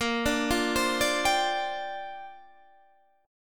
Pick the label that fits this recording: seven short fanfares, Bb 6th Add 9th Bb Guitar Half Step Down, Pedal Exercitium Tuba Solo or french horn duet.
Bb 6th Add 9th Bb Guitar Half Step Down